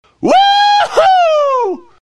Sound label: bat move